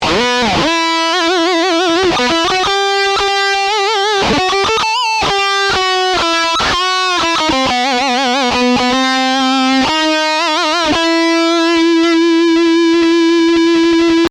Vibrato Etude, guitar

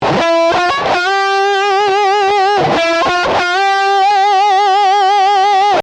Fast Bend, guitar

